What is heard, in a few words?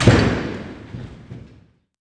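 Door Slam Distant Perspective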